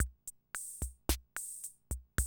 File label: CR78, DrumLoops